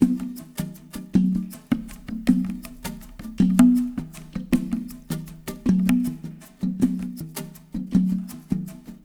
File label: TRANCE; Spectrasonics; Liquid Grooves 1 & 2; EUPHRATES